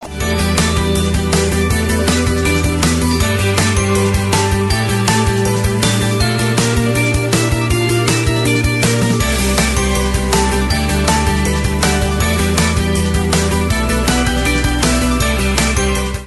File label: Vivo Ringtone; Y27 5G